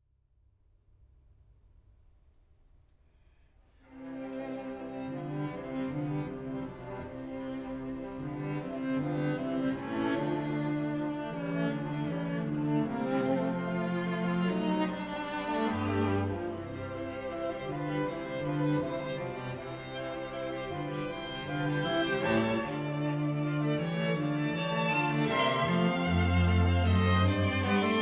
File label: String Quintet in C Major, Allegro moderato; Op. 29; Ludwig van Beethoven; Beethoven - String Quintets Op. 29 & 104